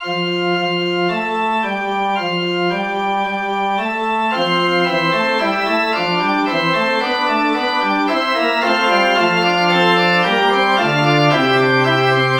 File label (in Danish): forspil